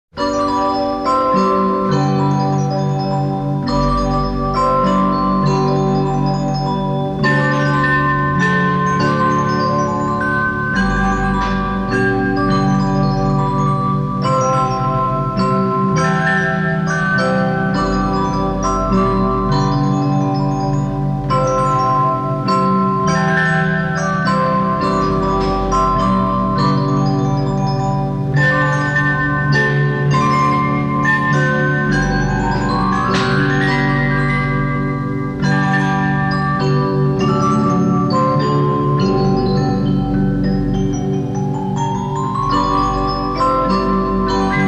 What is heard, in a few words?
silent night CHRISTMAS, band organ